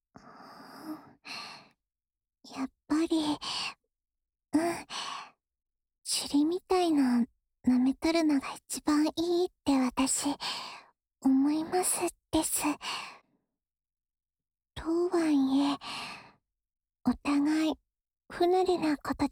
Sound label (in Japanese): アドリブ耳舐め（左耳）, ASMR; まいてつ Last Run!!